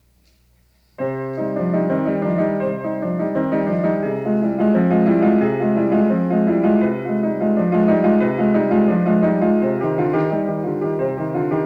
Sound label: Sonata in C Major for Violin Alon